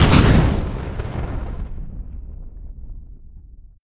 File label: firebolt; skyrim